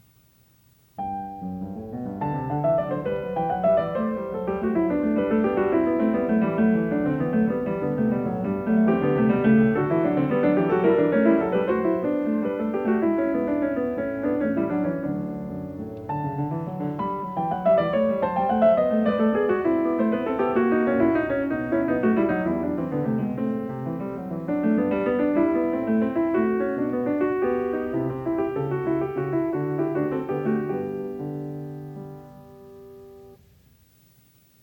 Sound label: Canone alla Seconda a 1 Clav.; Variation 6; Bach; The Goldberg Variations